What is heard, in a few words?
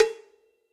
Cowbells Warm And Low; Drum Hits; Ableton; Factory Packs; Session Drums Club; MPE Hits